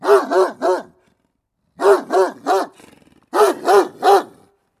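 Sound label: Dog german shepherd barking and snarling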